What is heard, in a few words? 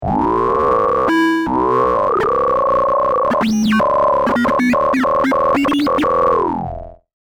talkbox